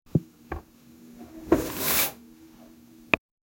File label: sound of wiping wooden; cinema